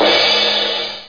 Crash Cymbal; Instruments; Sound Library 2000 - Wayzata Technology (1995)

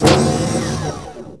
gate; 3DGameStudio

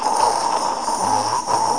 SHLURP

